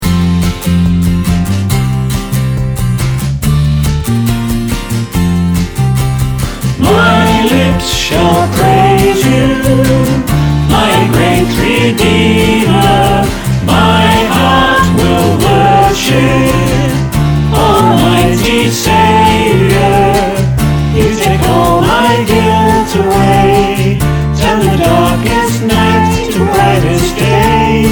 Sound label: choir